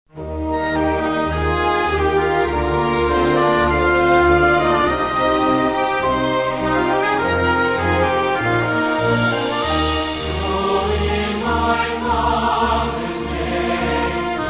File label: Singers And Orchestra